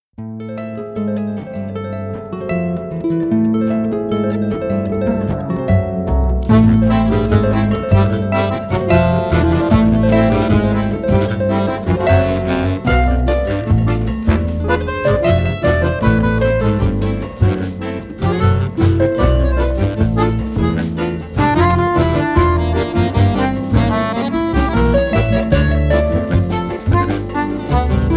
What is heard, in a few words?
Samba; Samba Para Parapente